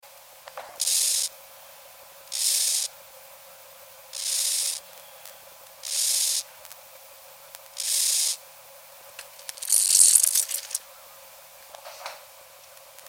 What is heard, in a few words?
Chorthippus dichrous lab recording 35°C 500 Hz high pass